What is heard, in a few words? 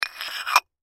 Ceramic Dish Scrape; Foley; Ceramic Dish Scrape Movement; Rocks; Materials